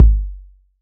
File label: Kick; Street Anthems Sound Kit